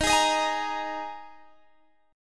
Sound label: Ab Suspended 2nd chord